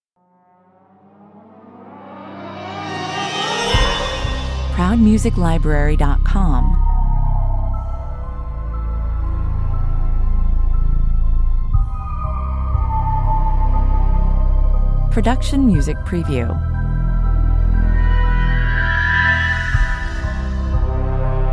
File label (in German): klangstarke Schattenlandschaften, düster und unheimlich, 120 bpm; aber mit feiner Story und kleinen Finessen, spannungsgeladen